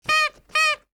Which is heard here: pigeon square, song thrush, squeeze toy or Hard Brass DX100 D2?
squeeze toy